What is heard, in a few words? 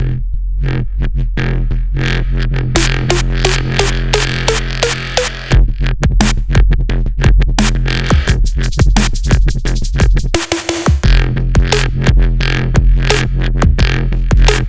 customizable drums